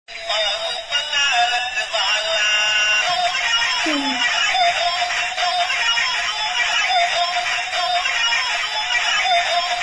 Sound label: ahha ahha